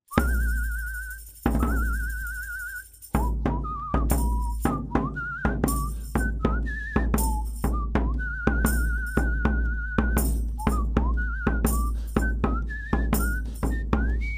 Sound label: Ride of the Valkyries Drum